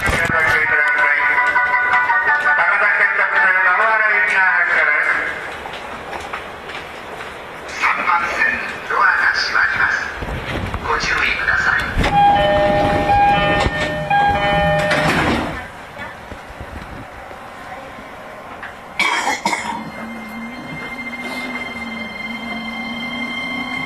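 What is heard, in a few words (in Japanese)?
E231系, 走行音; 恵比寿-渋谷; 高崎線直通普通電車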